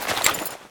draw; dead ringer; spy

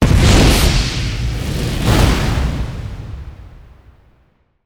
fireball; Garry's Mod; tf2

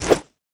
boxing gloves swing; tf2

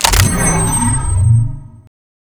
powerup pickup regeneration; Garry's Mod; tf2

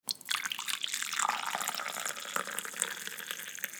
screamer; brainrot; ballerina capuchina

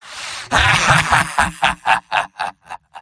medic laugh evil; vo; tf2; mvm

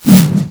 arrow near miss; weapons

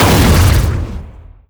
explosion charge; weapons; cow mangler; tf2

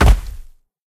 step, procoptodon; zoochosis pack1

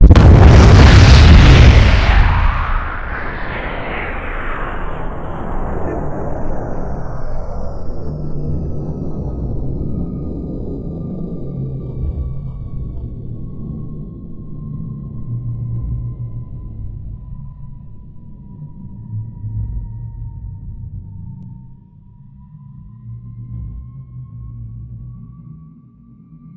fusion bomb, explosions; gbombs 5

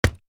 heavy taunt chest hit; vo; taunts; tf2

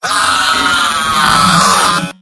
engineer pain critical death; tf2; mvm

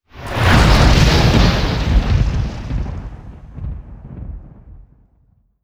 portal throw attack; nihilanth

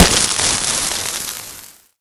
concrete impact flare; Garry's Mod; tf2

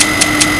minigun empty, weapons; tf2

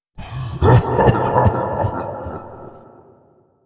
chuckle; nihilanth

